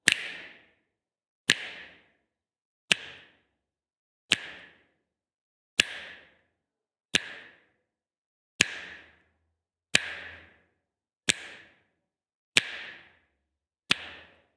snaps; human; NS Studios - ultra sound collection

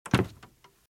Footsteps - Wood - Creaky - Walk - Left

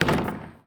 Street Ball Glass Back

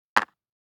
DICE on Cardboard, Drop, Standard, Five Dice; Samples; ArticulatedSounds; DICE Sfx Party (Extended)

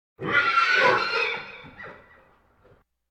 Animal effects snorts grunts farm ambience

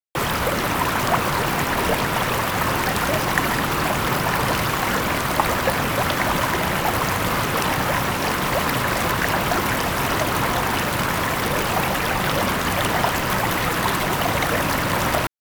water stream large

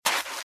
Footsteps - Sand - Dry - Run - Right

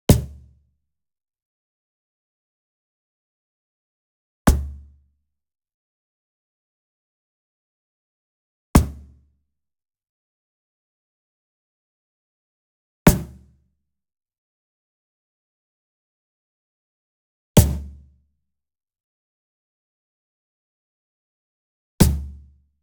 Wood Percussion Cajon High Hit Slap Near; boom library; Cinematic Strikes Construction Kit

